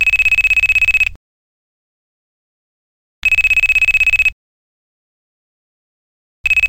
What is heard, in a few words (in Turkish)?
Ziiiirr